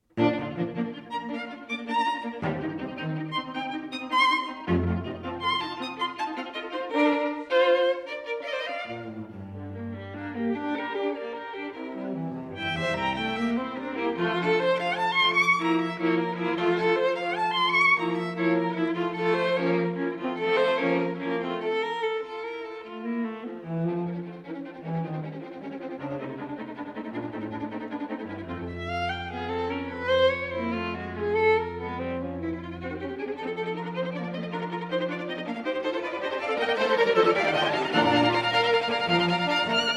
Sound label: String Quartet; Celebration